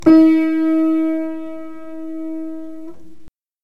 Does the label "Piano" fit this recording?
Yes